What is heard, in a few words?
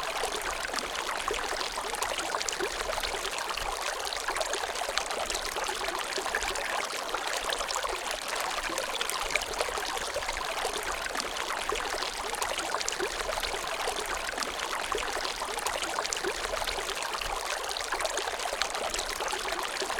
water, 自然音; 自然音研究室